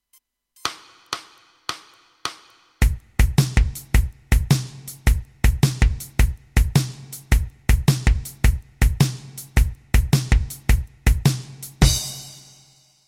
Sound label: blues shuffle